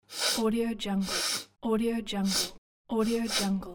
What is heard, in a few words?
sniff